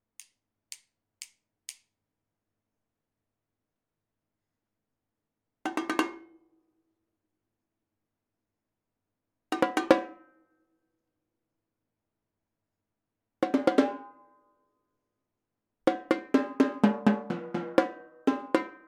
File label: tenor drums, marching band